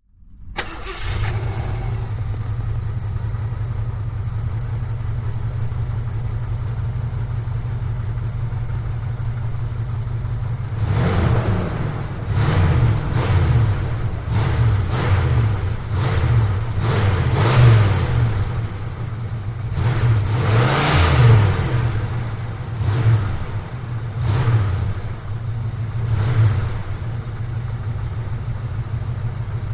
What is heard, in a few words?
Wagoneer: Start, Revs And Off, Jeep Wagoneer